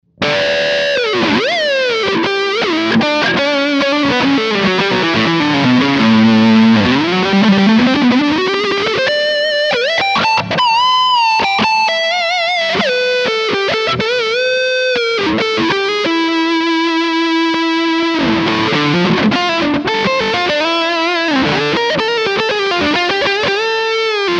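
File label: Highgain Lead Floyd Rose, guitar; Schecter C-1 FR-S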